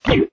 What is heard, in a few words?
wallbreaker step